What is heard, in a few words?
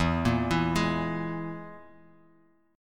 E Major Flat 5th; arpeggiated